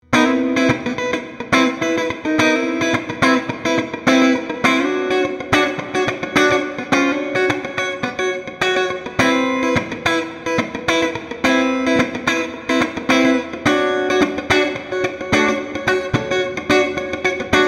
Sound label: fender squier bullet strat hss neck clean funk